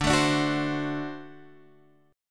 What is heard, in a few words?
D 7th; D7 Chord {X 5 7 7 7 8} strummed